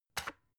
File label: electric whisk slider close; haus-garten; kueche